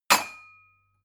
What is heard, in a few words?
glass shelve chink